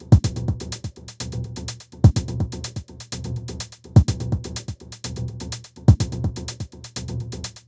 Drum 125bpm Top

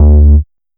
Syn Bass; Gumzz

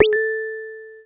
synth lead analog one shot A

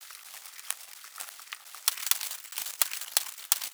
128 Rhythmic Texture

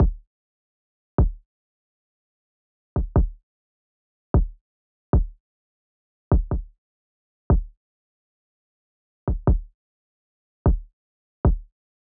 152 bpm Kick; HEISTY

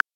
CR78, Rimshot, Hit

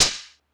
hihat field

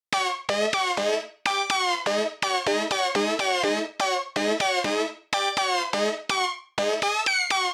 Synth Loop 124

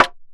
clap rimside

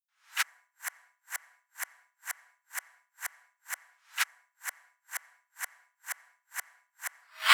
Presweeps 126 Cmin

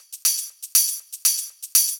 Tambourine 120 G

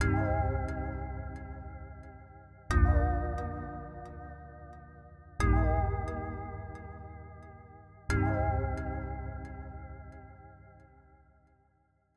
Music Box 89 C; Sugar

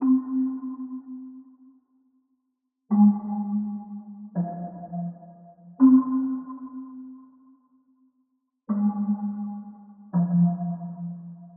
166 Cm lofi pluck

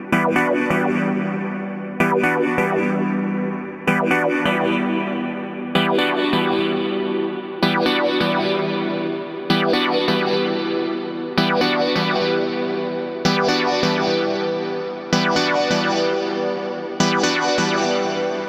Chord Loop 128bpm Emin

